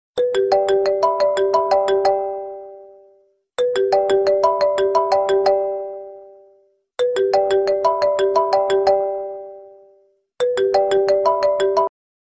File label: Samsung Galaxy Ringtone